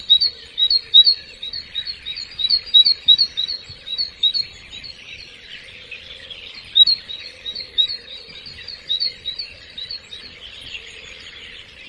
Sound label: birds